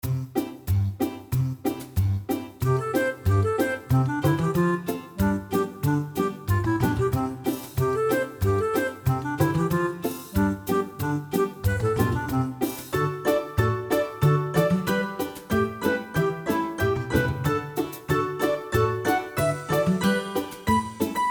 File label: walking